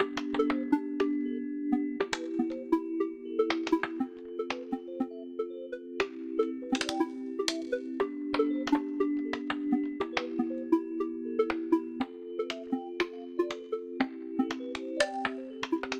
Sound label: Boring Basic D Dorian 120 bpm; MIDI Clips; Tonal; Ableton; Factory Packs; Lost and Found